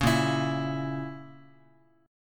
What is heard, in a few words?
A sharp Diminished A sharp, Guitar; strummed; 4A,4E,5A,5D,5G#,6C#; JGuitar